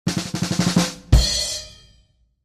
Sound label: Drums Comedy Fill & Stop